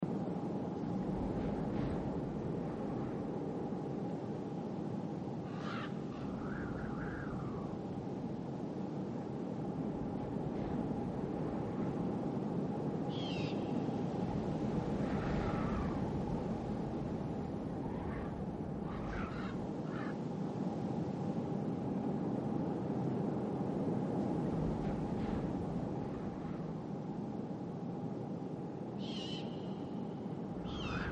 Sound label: ambient